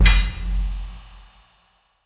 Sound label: ui epic loot toast; pretty lootalert